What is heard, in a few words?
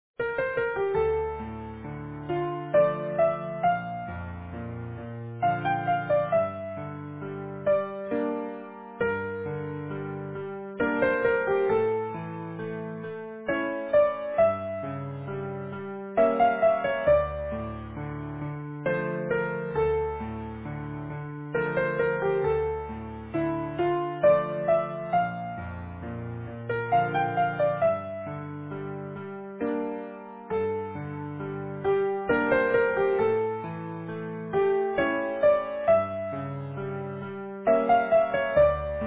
Easy Piano